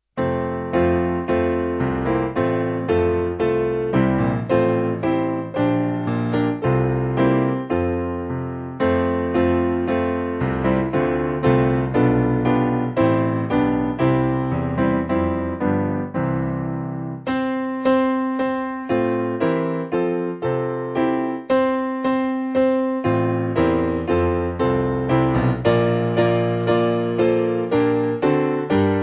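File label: Piano, backing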